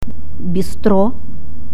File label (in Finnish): ruokala